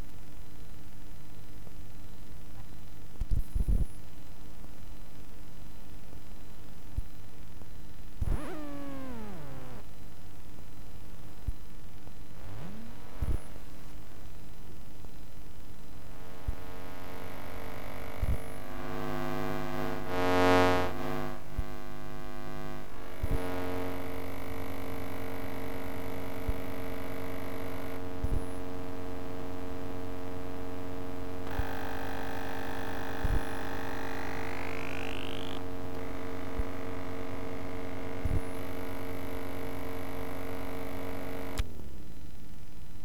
playstation2, telephone pickup

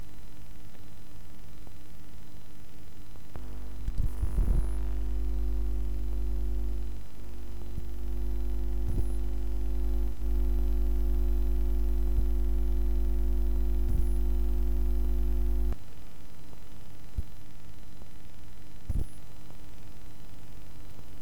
toaster, telephone pickup

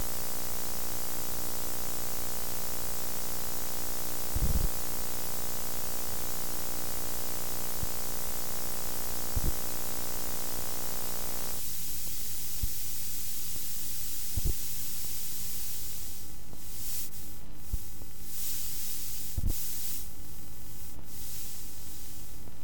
pclink switch, telephone pickup

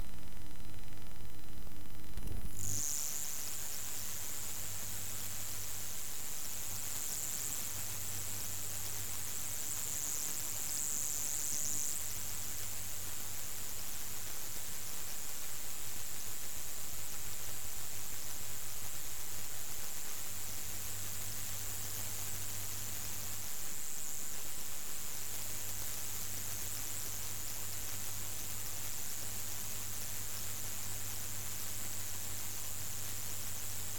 plasma clock, telephone pickup

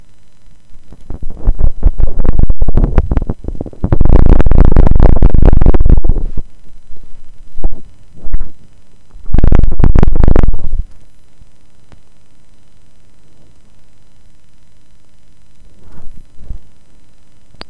torch, telephone pickup

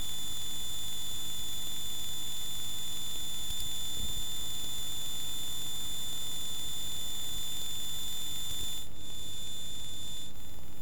ethernet, telephone pickup

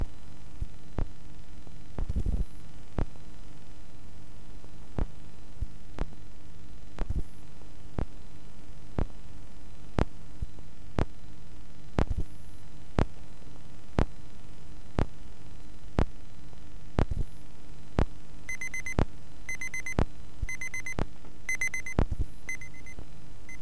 travel clock, telephone pickup

